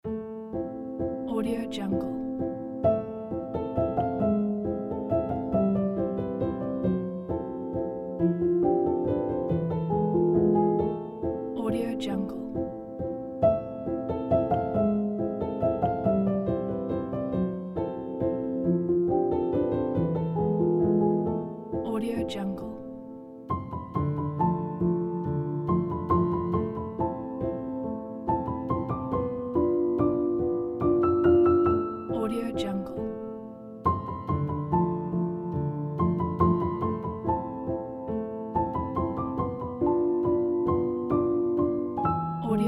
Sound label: wartime rustic felt piano